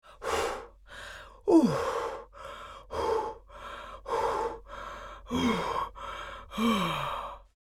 Female old woman tired breathing

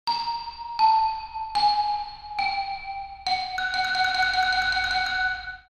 Cartoon xylophone disappointment

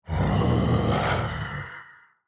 Demon king lord growl grunt

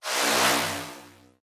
Science fiction slow futuristic whoosh

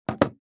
Calculator put down on drawer in office

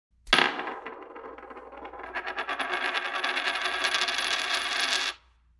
Coin spinning on wooden floor

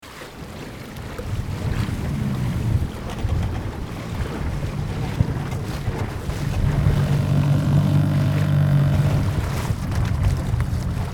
Motorboat starting up and away

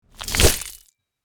Knight sword stab and gore

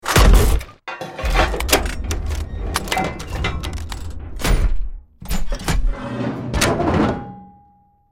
Mechanical metallic sequence gears movement

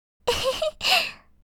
Cute giggle laughing anime girl vocalization

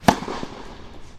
Strong tennis serve

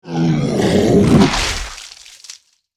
Werewolf bite flesh attack

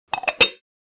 Ceramic jewelry box close

